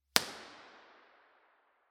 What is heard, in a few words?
air museum